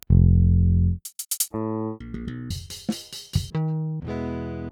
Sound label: Pultec; Virtual Analog